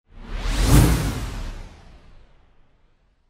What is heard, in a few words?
woosh